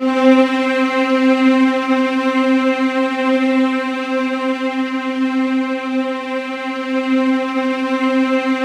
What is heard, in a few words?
C3, Roland JV 2080 TECHNO PAD